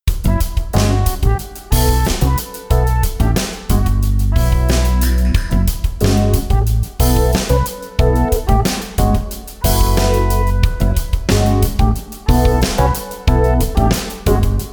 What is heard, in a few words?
Key Of E minor